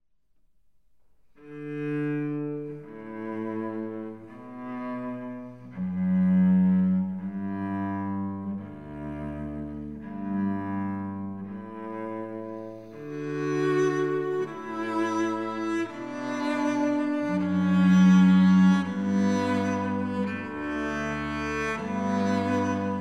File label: Cello Duo; pachelbels canon